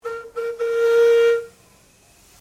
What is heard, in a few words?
Steamtrain Whistle; Bellarine Tourist railway vehicles; Trains